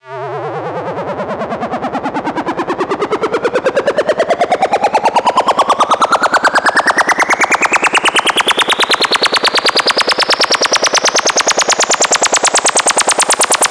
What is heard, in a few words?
Sweeps Up, Effects